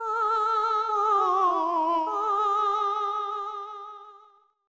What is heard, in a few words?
Jig; eigo de tabisuru little charo